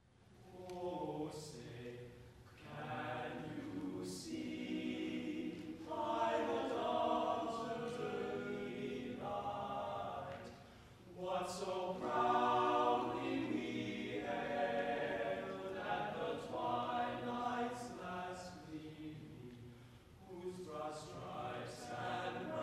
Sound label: Star Spangled TTBB